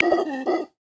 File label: Black Howler Monkey; Xiaomi Redmi-Note-8-Pro